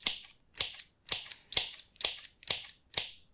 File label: Scissors Cuts